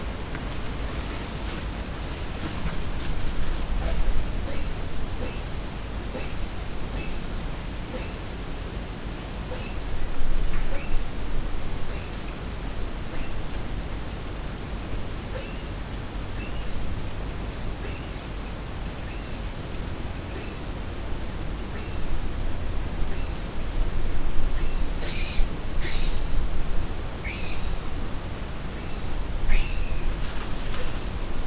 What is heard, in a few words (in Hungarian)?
egy a padláson egy a földön, nagy pele; allathangok; termeszetben; perkupa